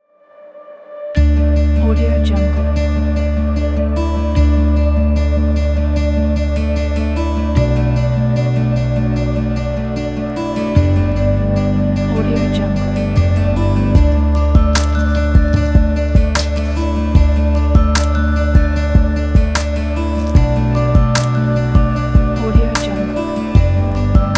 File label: ambient acoustic folk